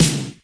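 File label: snare drum sound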